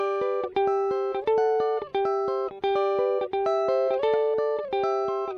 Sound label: African Guitar